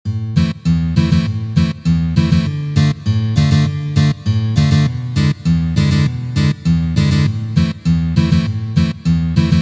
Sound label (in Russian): Blatnoj ritm